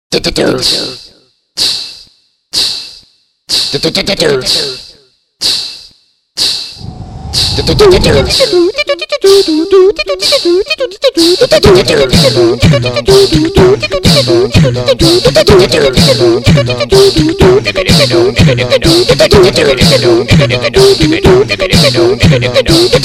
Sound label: vocal